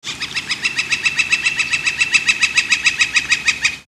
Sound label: northern flicker call